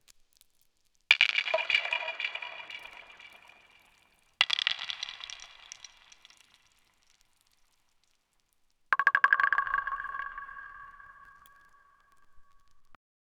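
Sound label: the sound of stones falling, well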